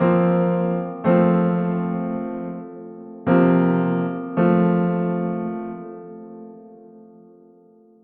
Fsus4 F

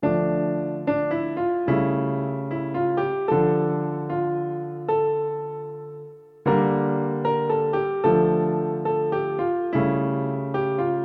triplet in dm

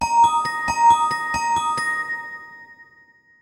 celesta

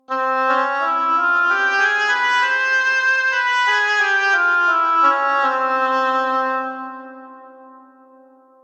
oboe gypsy scale

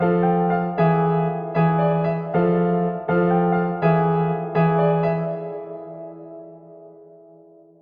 tatata

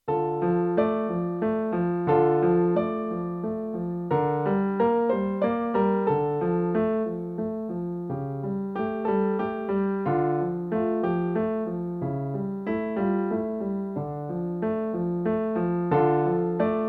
romance

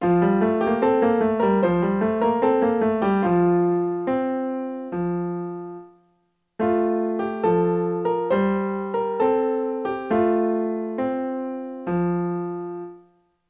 dotted 8th note in F

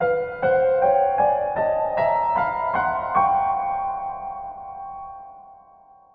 tritone chromatic scale

